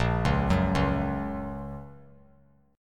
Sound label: A sharp Minor Sharp 5th, down; arpeggiated; JGuitar